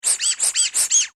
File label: toy duck rubber squeeze; Toy Animals; Toys